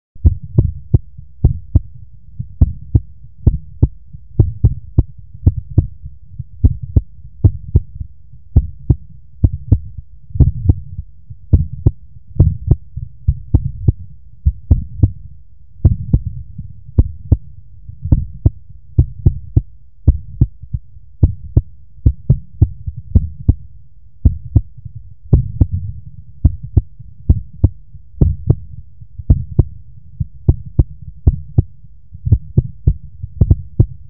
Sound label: real human heartbeat soun